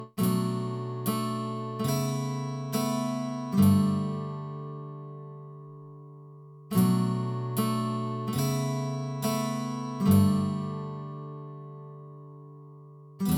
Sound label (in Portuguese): violao acustico